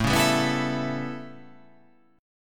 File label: A 9th, down